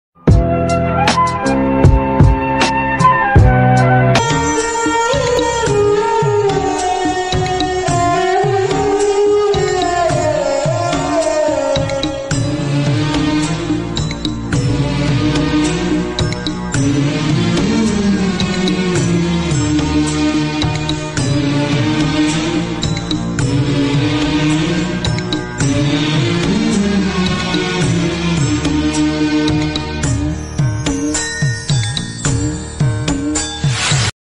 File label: emotional bgm; andarivaadu movie